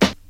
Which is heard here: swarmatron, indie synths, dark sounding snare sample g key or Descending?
dark sounding snare sample g key